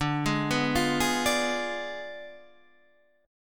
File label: D Diminished 7th D down Guitar Standard; Ddim7 arpeggiated; JGuitar